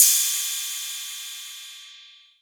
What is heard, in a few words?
808 Cymbal; Novation Drumstation